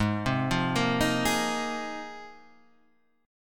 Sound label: G sharp 6th Add 9th G sharp Guitar Half Step Down